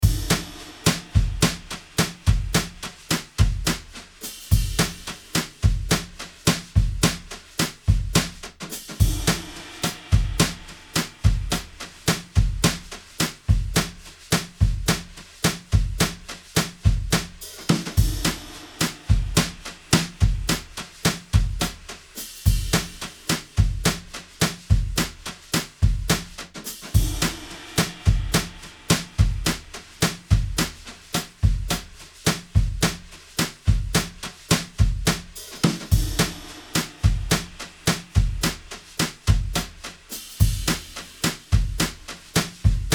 brushes

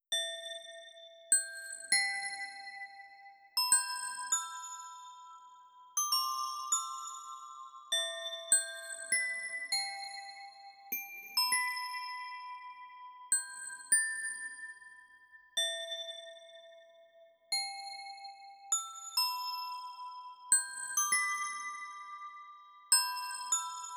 glockenspiel 100bpm C

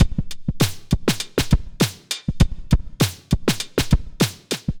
Drum Factory 100 bpm

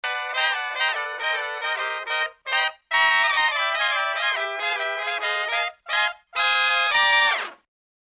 brass loop

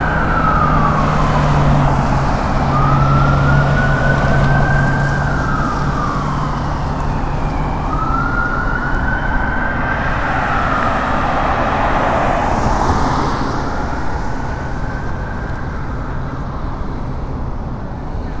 sirens

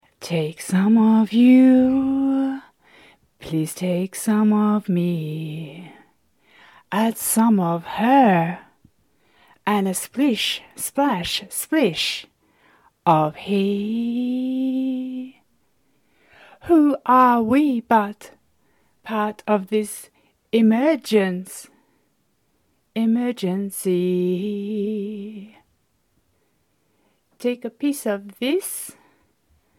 Emergence Sea with MMT (Clean recordings)

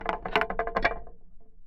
contact perc

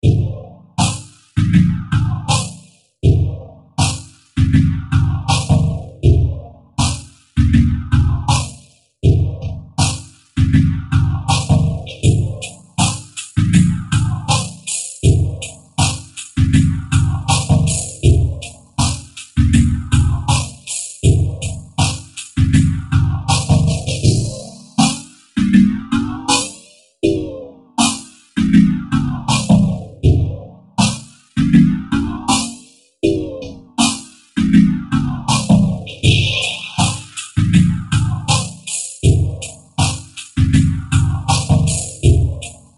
Vocophaser Beat

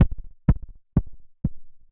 drum kick shots; drum-kun

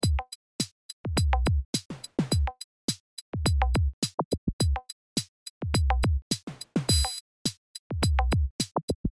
beat

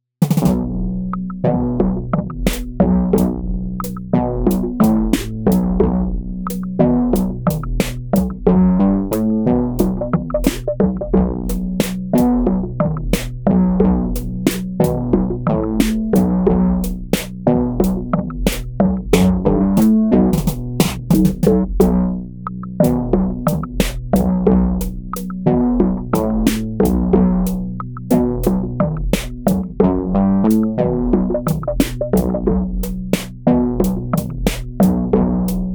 90 Rhythm Section fx

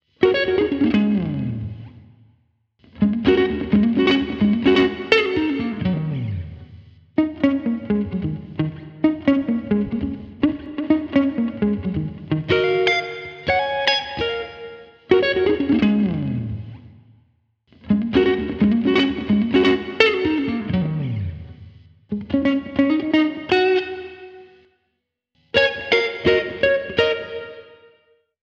PIGEON FUNK

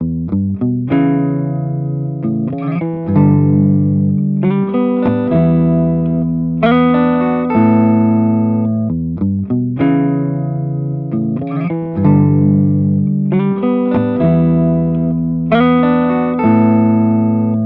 A GT Riff

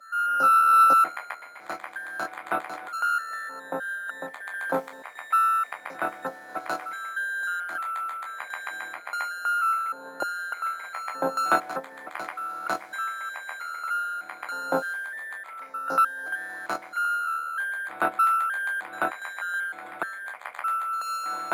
120 Noises Ambient Samples

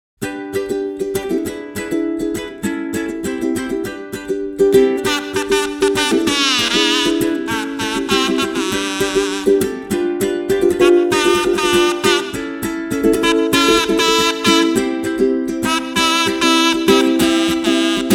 Kazoo and Uke

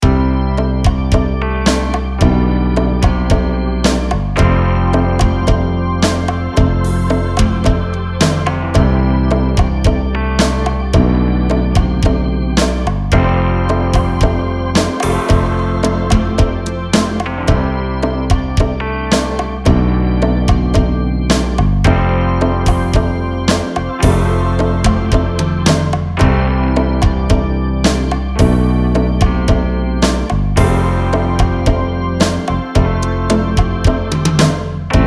Keytar Stuff in C; admiralbob77

